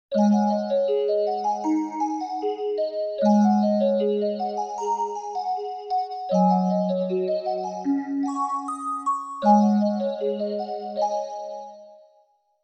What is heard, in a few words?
cheesy Bells, v-synth